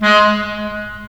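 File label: wind clarinet; Roland - LA Composer vol. 3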